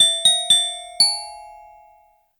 Hovering Gull

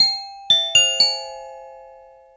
Hovering Condor

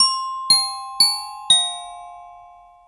Diving Raven

